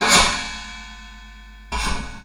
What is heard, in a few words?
Raw HiHat, contact mic